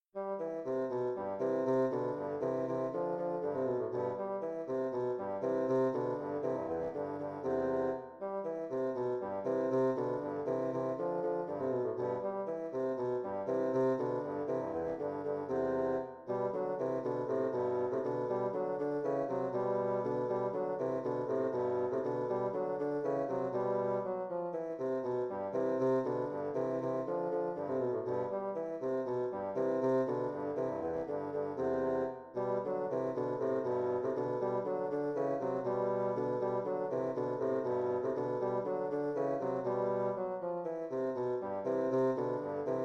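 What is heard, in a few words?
bassoon duet; duo no.2; anton heberle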